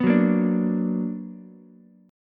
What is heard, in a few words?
B Minor Major 7th, Ukulele; strummed; JGuitar